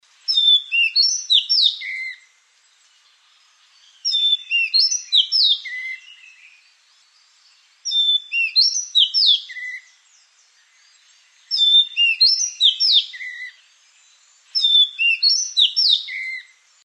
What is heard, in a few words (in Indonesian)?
kacer madagaskar